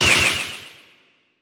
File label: Planet Pre Explosion